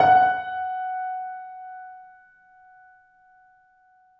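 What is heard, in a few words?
piano forte f#5, Grand Piano; Sonatina Symphonic Orchestra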